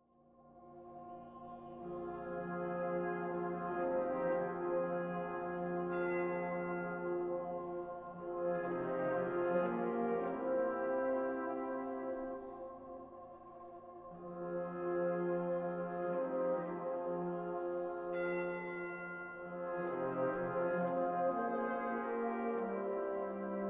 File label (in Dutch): Fanfare; Fanfare Toeterdonk; Engelenburcht